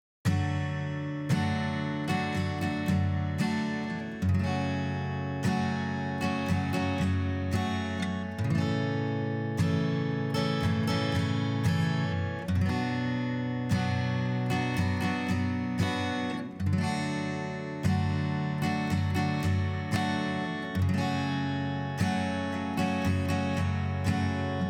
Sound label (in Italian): Acustiche Sample; amplificatore BBQ 2-2